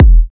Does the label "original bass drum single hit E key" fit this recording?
Yes